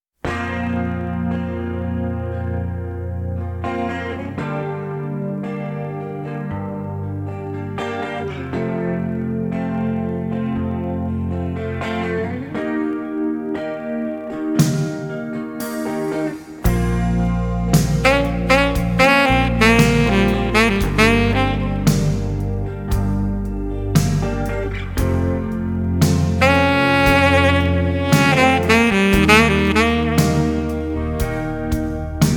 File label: sax